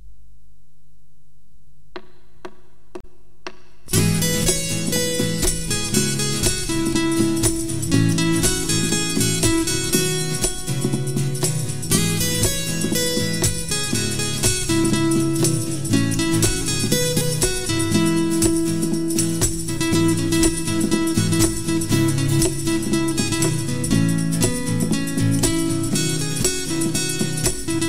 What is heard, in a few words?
guitar arrange